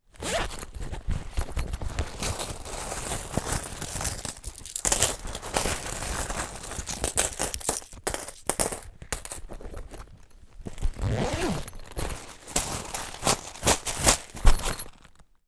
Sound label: bum bag open zipper money coins handle close then movement; foley